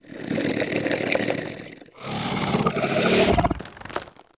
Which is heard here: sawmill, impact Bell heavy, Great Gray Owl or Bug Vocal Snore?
Bug Vocal Snore